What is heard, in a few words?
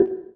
Wrap Boundary, mono; iOS 26 Sounds